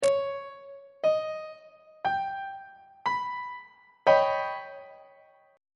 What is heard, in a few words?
d sharp augmented 7th 3rd inversion on treble clef